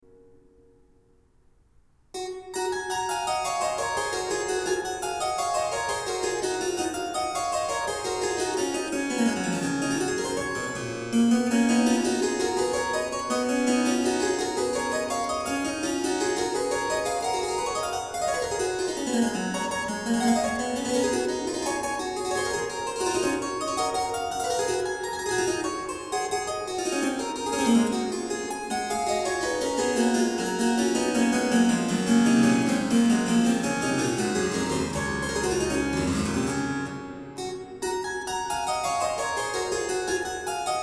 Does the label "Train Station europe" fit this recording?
No